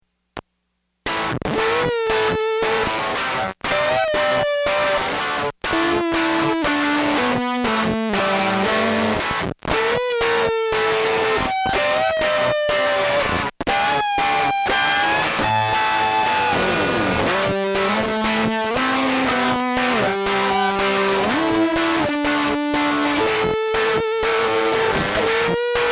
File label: guitar jam; devi ever usa